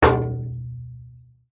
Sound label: impacts metal trailer side hit knock